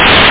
stick

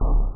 BOX HIT

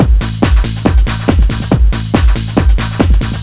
ALPH BASS, DRUMLOOP; Groovy Bytes: Behind the Moon

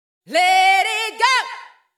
VOICE GRL HOUSE 125, House Voice; eJay

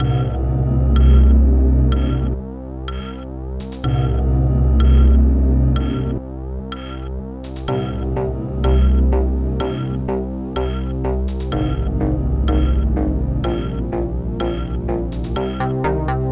ashido music

